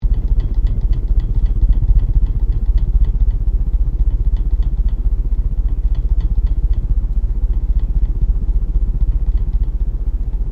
FAN CEILING BROKEN BESIDE LOOP; Various fans; Fans (Sound Effects:Electronics)

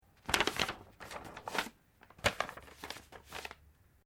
PAPER SHEET MOVEMENT DOUBLE FOLD SMOOTH; Material components for constructing more complex sounds

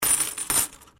Coin Penny Multiple Drop Wood

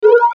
Mouseover Short Digital Ascend; Multimedia; Blastwave

